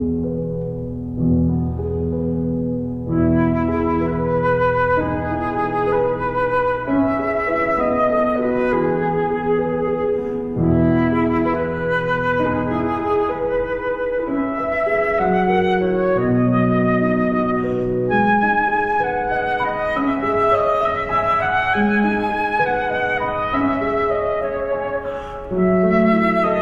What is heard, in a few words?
Berceuse (Lullaby) for flute and piano